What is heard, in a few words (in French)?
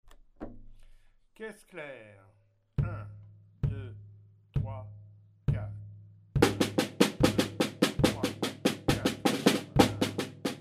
caisse claire